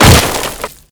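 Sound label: wood crate break medium; Explosions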